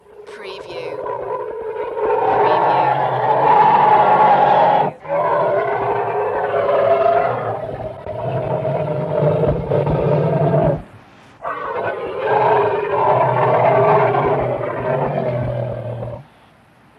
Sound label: Elephant African Growling Vintage